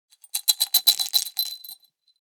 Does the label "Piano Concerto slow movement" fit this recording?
No